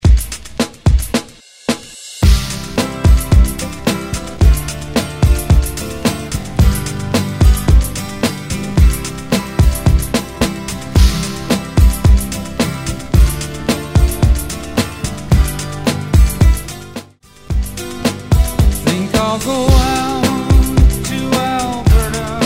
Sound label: 70s redrum